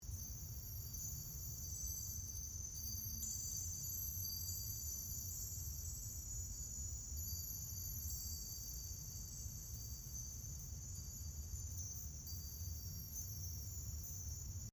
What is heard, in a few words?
Floating Symbol Ambience